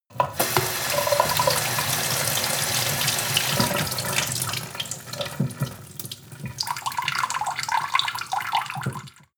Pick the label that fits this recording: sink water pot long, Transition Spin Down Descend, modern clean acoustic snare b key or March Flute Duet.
sink water pot long